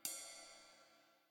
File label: Drums Ride; smite; raijin